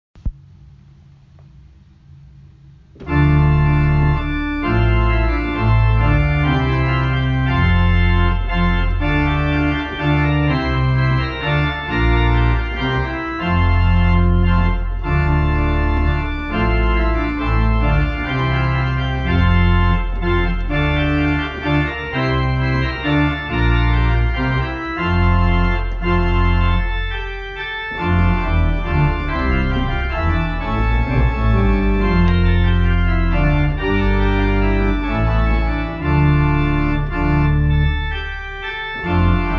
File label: Basse Dance; Peter Warlock; Capriol Suite